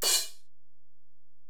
Ride, Alesis DM-PRO

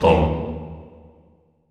CHO, Roland

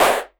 Elektron Monomachine, Drum Machines

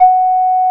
OB8 blow F#4, OBERHEIM OB8; Big Fish Audio; First Call Vintage Keyboards

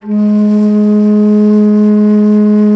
BASS BONS, India Winds; E-MU Producer Series Vol. 7 – Old World Instruments

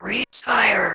Female vocals and robo-vox

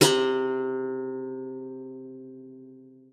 C3, GUITAR; Xsample VOL-2

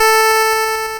NOTE A5, LSDJ; LIMAT LSDJ kit; LSDJ Notes; NOTE KIT 6; Drums

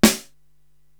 SD Dry, Korg TR-Rack drum machine

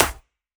Chh, Drum Hits; Zero-G Trance Inducer; 90 s Sample CDs

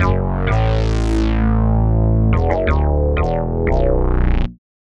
D.B. Bass, Synth Bass Loops; Sonic Foundry (Sony Creative Software); Groove Elements

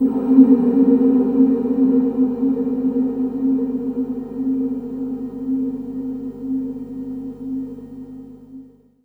EFFECT, Explosive Jungle; USB Soundscan vol.05; AKAI

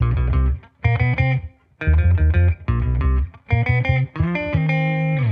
P Bass Tele Mix 90, Guitar Bass Mix; 90bpm; funk soul

